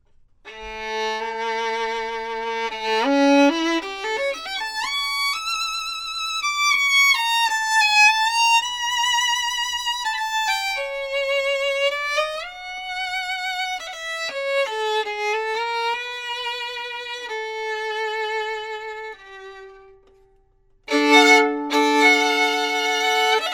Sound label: violin, professional